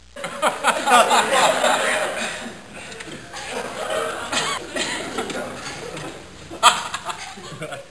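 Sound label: Giggles and Chuckles Mixed Crowd; Soundsation; Human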